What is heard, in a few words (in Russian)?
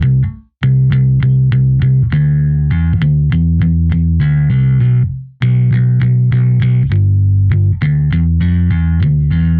Break Bass B, метал; бас